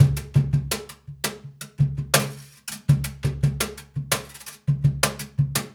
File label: Cajun, Percussion, Drums; Essential Percussion